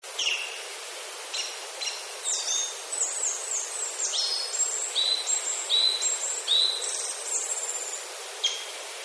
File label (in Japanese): kurotsu yama